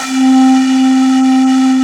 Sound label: pan pipe C4; Big Fish Audio; Synth City